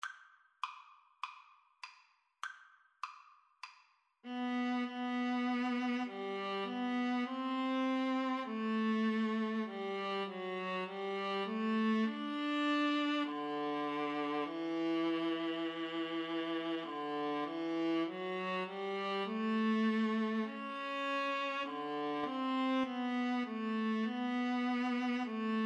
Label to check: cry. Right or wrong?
Wrong